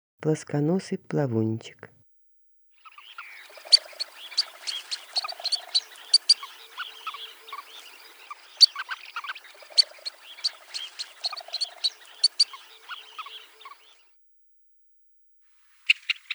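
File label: ploskonosyy plavunchik golos, red phalarope voice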